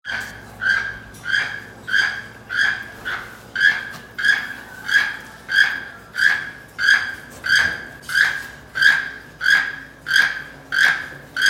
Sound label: Toucan, Keel Billed; Keel Billed Toucah